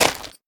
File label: Footsteps Dirt